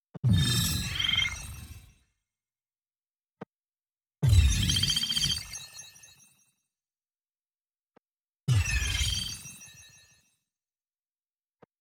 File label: Electro Alient High String